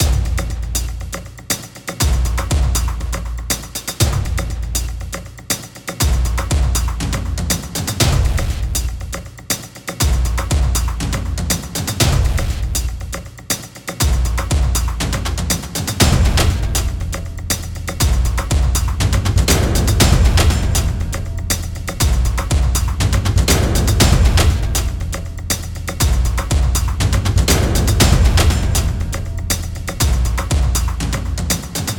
Percussion Loop; Dual Blade Battle of the Female Ninja

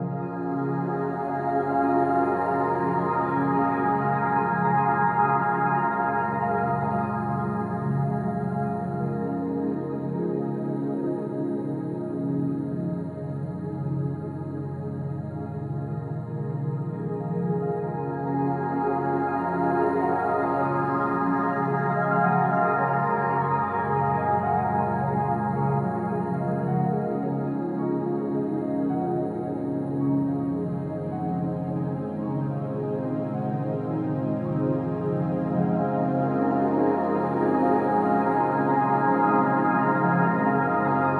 Raindrops; Hexcells

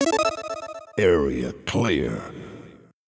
Area Clear; Huntdown

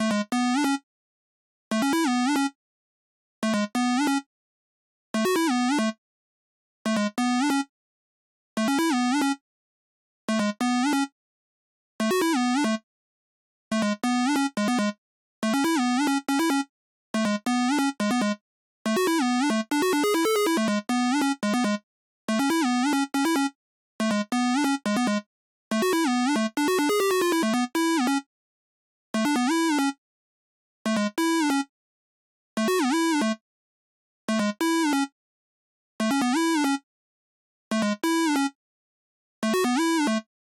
Melody; chippy

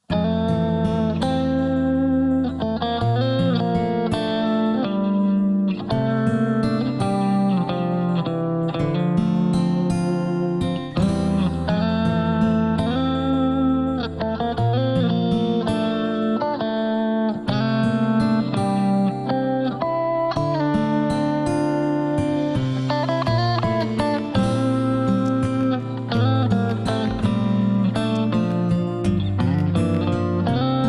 Substory Music; yakuza kiwami unreleased songs ps4 gamerip 2019

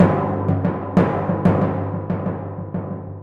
Timp G

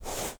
Grass; blastmorph pinball; xbox series xs

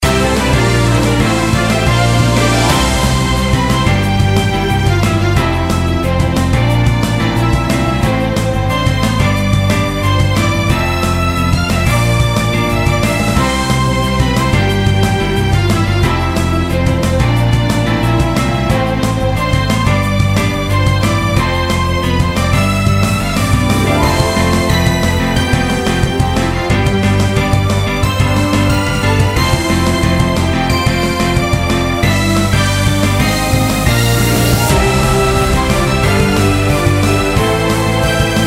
Raid; Mist Train Girls

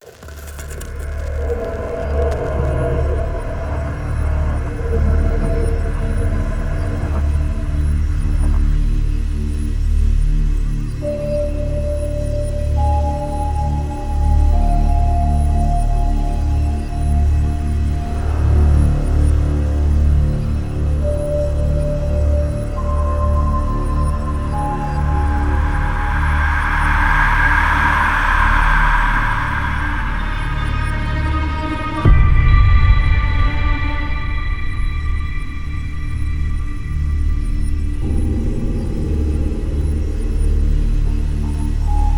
Creepy Cabin; Zombie Stalingrad - Underscore - Pavlovs Creepy Cabin

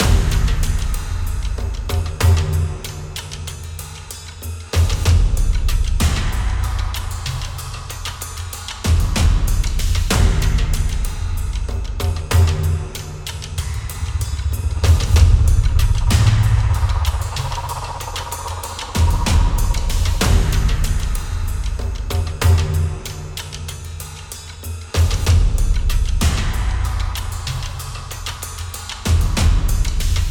Perc Loop; In Darkness

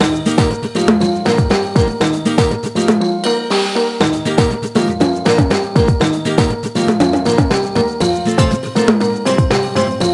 Rumba; MTV Fan Attack

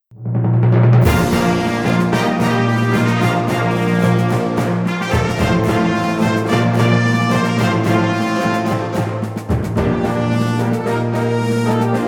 British Travel Music; Pirates of the Burning Sea

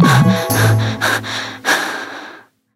water out of energy

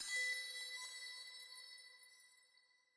Alchemy Flute Combo

